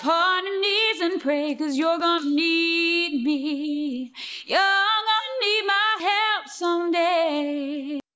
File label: noisy, vocal; sgmse